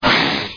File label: lich attack up